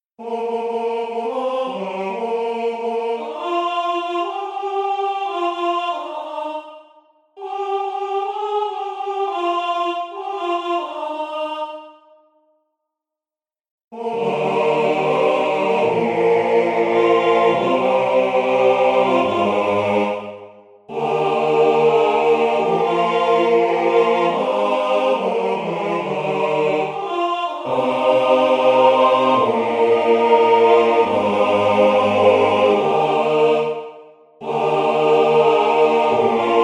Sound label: MIDI SATB